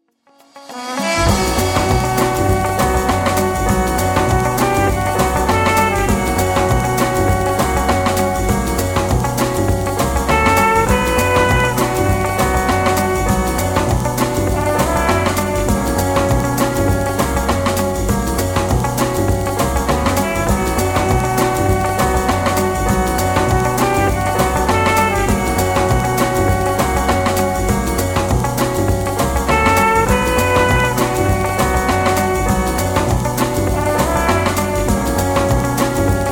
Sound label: tokyo waltz